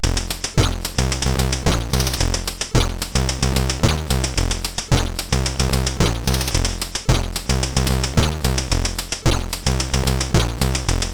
Funk, sid CSG8580R5

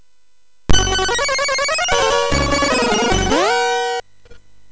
Music Demo Version, MOS6581R3; hvsc

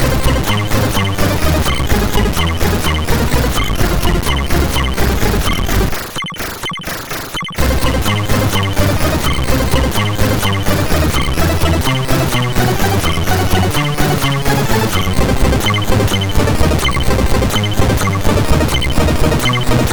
Music Samples, sid, MOS6581R2

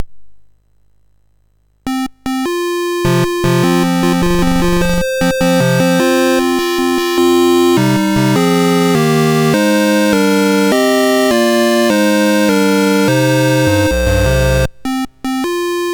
Dragonworld sid MOS6581R4

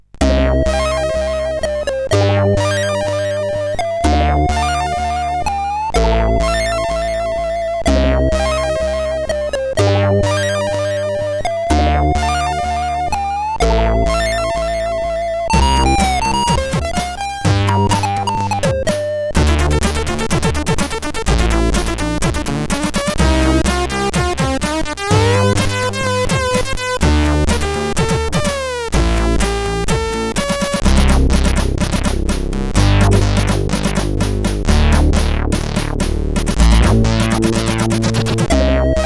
Fast Atmosphere, CSG8580R5

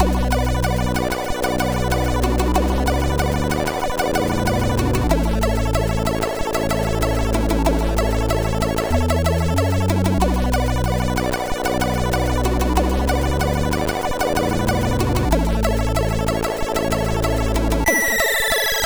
Hip Hop, sid MOS6581R4

